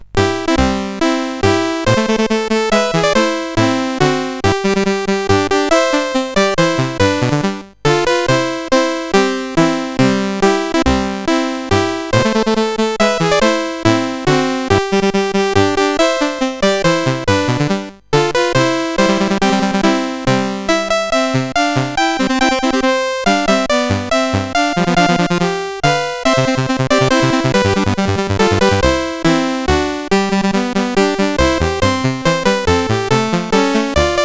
Anthem, CSG8580R5

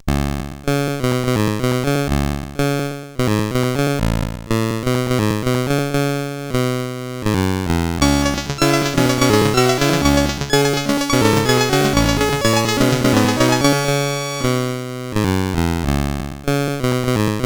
Intro, CSG 8580R5; First Cracking Girls

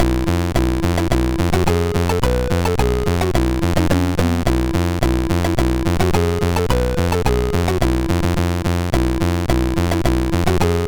Eski Ordu Marsi, MOS6581R2

